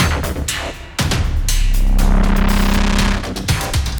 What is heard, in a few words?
Taunt; Skyway